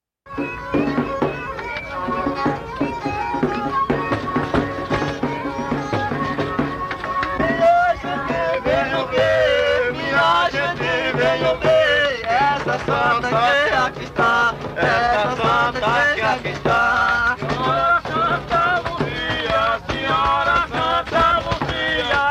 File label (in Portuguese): Canto a Santa Luzia, rezas cantadas; Cantos da Esmola - Memorias de 1988